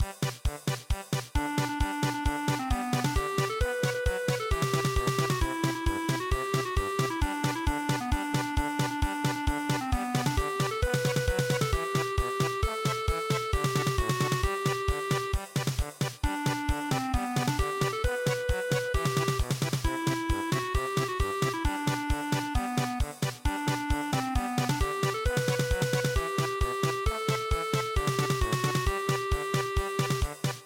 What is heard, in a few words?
polka